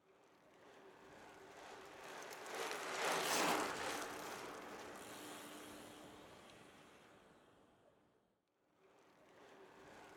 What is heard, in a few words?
Bicycles Passing